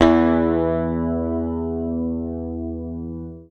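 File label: bass, E2, right channel; PICK; Sampleheads; Will Lee Bass Library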